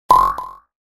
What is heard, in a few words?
PAD